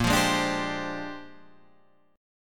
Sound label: Bb 13th, Guitar, Standard; Bb13 strummed